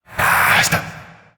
Possessed Red Veil Vocal Attack

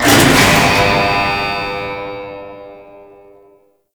Grineer Shipwreck Rocket Start; Gameplay; Warframe; Tau

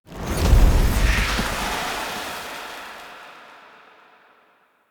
Spirit Death Wall Relief Dissolve; Enemy; Ashen Spirit

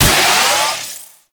Damage Amp Power Up; Trapper

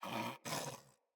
Snore; Pet; Enemies; Catbrow; Pet Ship

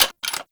SMG Alt Mech; Warframe; Tenno; Sundial SMG; Weapons

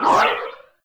ring cast; Universe Ring; Warframe; Nezha

